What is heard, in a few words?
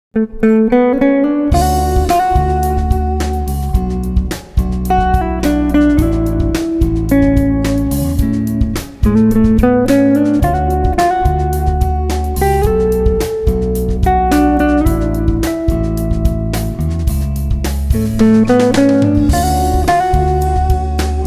Dinner Jazz